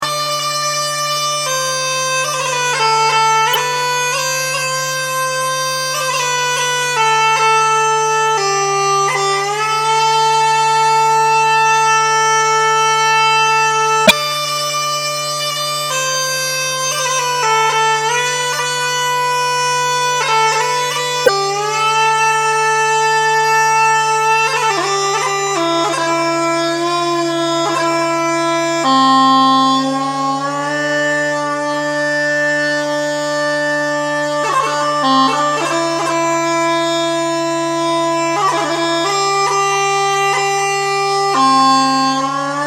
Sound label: acoustic